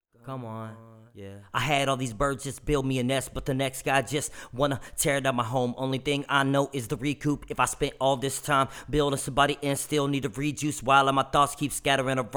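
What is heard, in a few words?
hip hop, 125, C major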